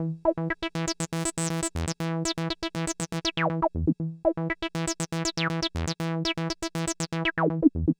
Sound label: Dance Pulsing Synth Emin; Dance DJ; Digidesign; Pro Tools Essential Loops